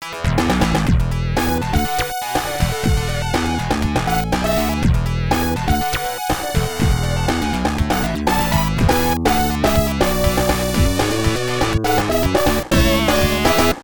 Solo Victory Dance; Tempo (32X); Sega; Megadrive-Genesis

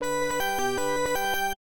Jingle; Chiki Chiki Boys; Sega; Megadrive-Genesis

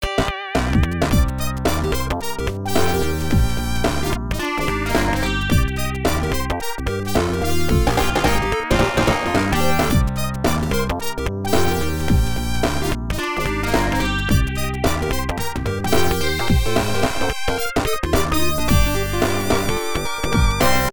Victory Dance; Bee-Bees' Victory Dance; Tempo (32X); Sega; Megadrive-Genesis

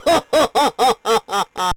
Voice Hahahahaha; Altered Beast; Sega; Megadrive-Genesis

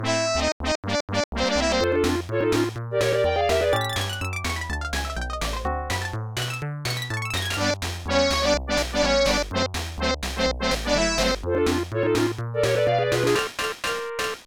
Fanfare; Chester Cheetah Fanfare; Chester Cheetah - Too Cool to Fool; Sega; Megadrive-Genesis

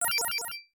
Powerup; Bishoujo Senshi Sailor Moon; Sega; Megadrive-Genesis

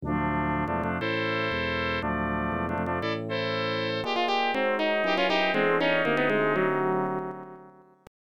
Zap; Ghouls n Ghosts; Sega; Megadrive-Genesis

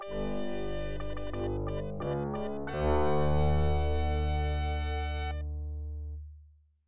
Victory or Defeat; Beast Wrestler (Beast Warriors); Sega; Megadrive-Genesis

